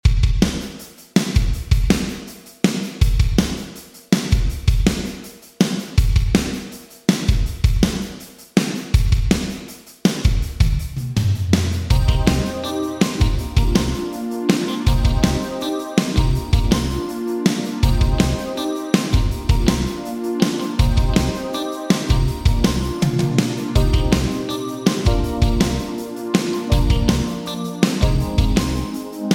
Drums EMT252 250; Vintage Digital

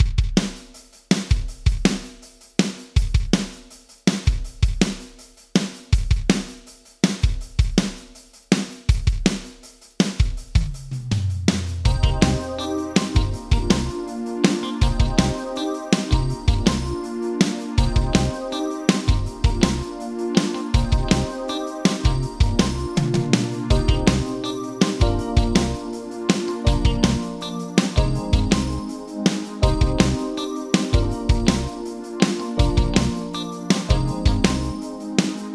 Drums Yamaha D5000 Ambience; Vintage Digital

